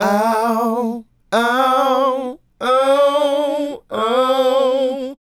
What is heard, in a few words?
Male Group, Hip-Hop and RnB Vocals